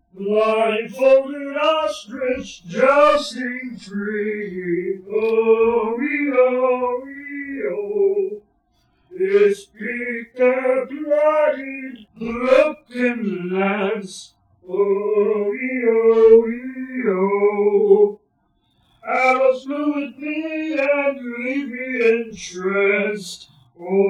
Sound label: ostrich